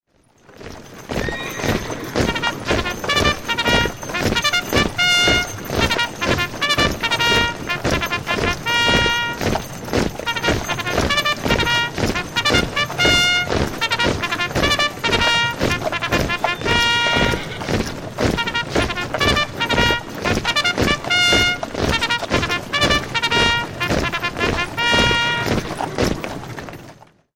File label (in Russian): kavaleriya marsh